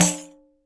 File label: PANDEI CLOSE, BRAZIL